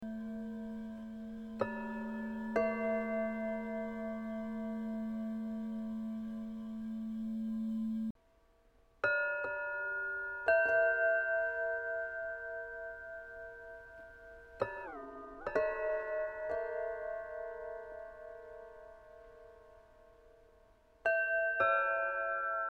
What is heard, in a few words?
CRYSTAL BOWL